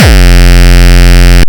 kick

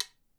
HIT, Percussion; Miroslav Vitous - Percussion & Harp

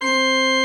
Church Organ C 1; Best Service; Advanced Media Trax Modern Composer

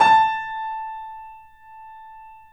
A -L, The Piano; InVision Interactive - The Piano

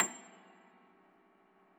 piano A6, Thumb Tack Piano ff +ped; pno29; Total Piano

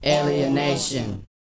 PITCH MOD; ILIO; Vocal Planet VOL-4; R&B & Dance; SCI-FI CHZ

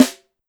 Snare, Pitched Up; Clavia DDrum-AT

